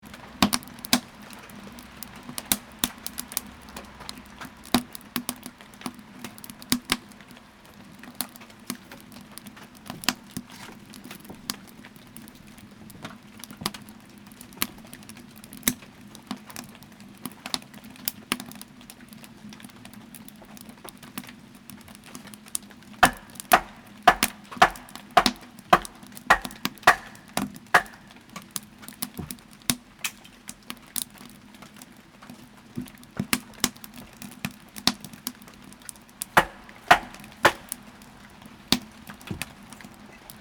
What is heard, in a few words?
Wood Stove Rain Cabin Porch; Places